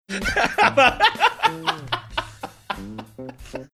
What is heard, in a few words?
laughing